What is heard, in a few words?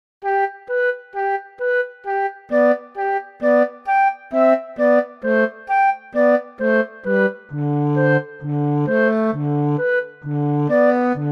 Flute Cello, instrumental